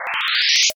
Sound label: window open